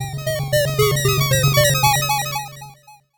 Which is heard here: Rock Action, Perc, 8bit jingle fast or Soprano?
8bit jingle fast